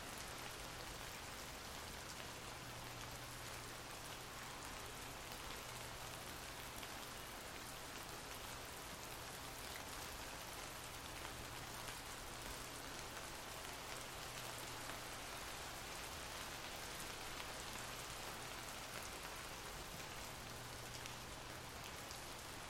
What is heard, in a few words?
rain Light